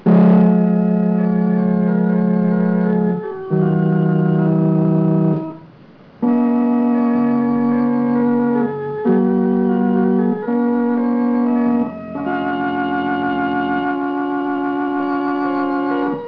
orgao

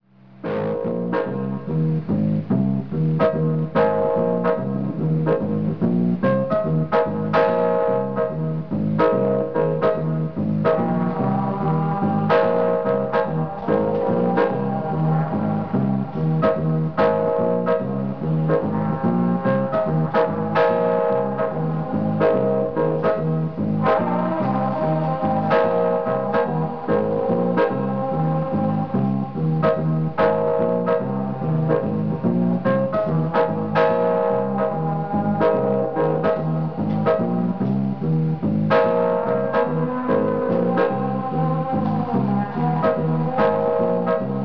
ritmada

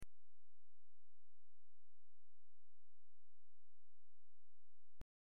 BATIDAO

